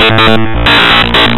Harsh Digital Noise

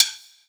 Roland SC-88 Sticks

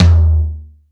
Floor Tom

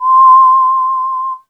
Kawai K1r Whistle C6

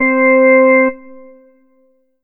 Ensoniq ZR-76 Flute Stops C4

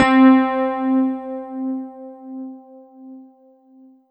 Roland JV 2080 SA Rhodes C4

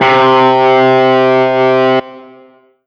Roland SC 88 Overdriven Guitar C3

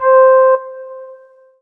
Korg 01W Flute C5

